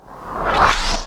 hrrrrwhleuu; Truth-Hz - 1-Hit KO Sample Pack

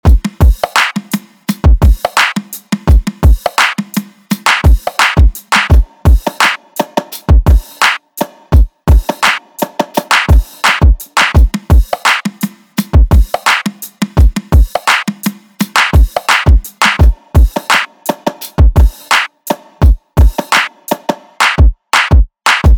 drop loop; Crowdsourced Sample Pack; Drum Kit; Drum Loops

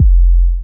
808, Drum Kit Kicks

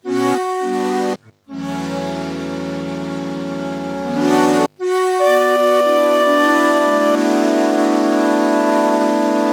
omnisphere chords; Crowdsourced Sample Pack; Crowdsourced Live Stems

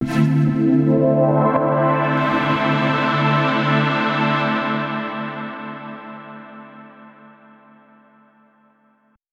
Microkorg SP404 Pad; Crowdsourced Sample Pack; Pads

